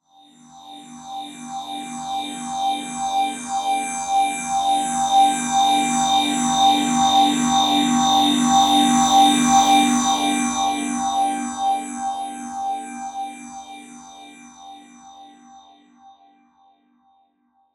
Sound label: synth texture; Bluezone Corporation; Subspace Distortion; Sci Fi Cinematic Samples; GDC 2018 - Game Audio Bundle